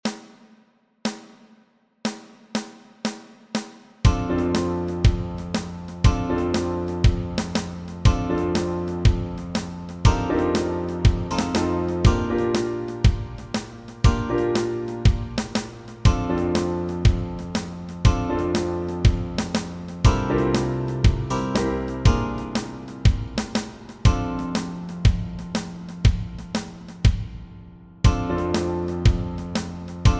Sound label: Backing Track, walking bass